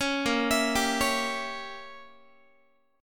Bb Diminished 7th Bb Guitar; Bbdim7 arpeggiated

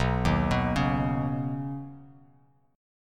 B Major Add 9th B, chord; Badd9; arpeggiated; 7,6,9,6

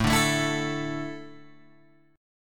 A Suspended 4th, Guitar Open G

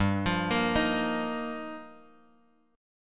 G Minor 7th Sharp 5th, Bass Standard; Gm7#5; arpeggiated; JGuitar

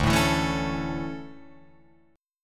D Major 7th Suspended 2nd, Guitar Open F; strummed; JGuitar

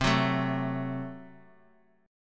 F sharp Diminished C

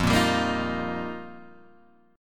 F 7th Suspended 2nd Suspended 4th, Guitar Open G

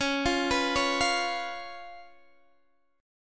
C sharp 7th C sharp, Guitar Open D

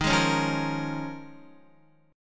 Eb 7th Suspended 2nd Suspended 4th down Guitar; Eb7sus2sus4 strummed; JGuitar

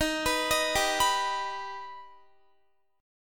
Eb Augmented, Guitar; x,18,21,20,19,18; 4E,4A,5D,5G,5C,6F; arpeggiated

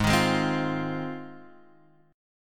G sharp 6th

